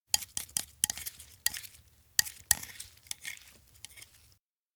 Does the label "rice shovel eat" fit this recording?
Yes